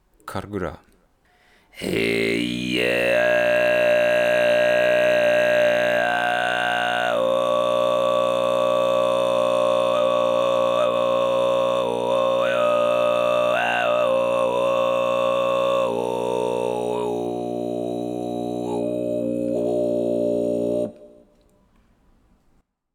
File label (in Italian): Audioesempi Dag Kargyraa